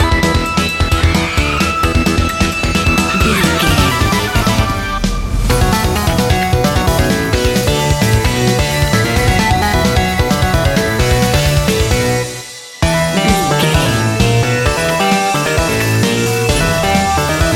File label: extreme racing video game level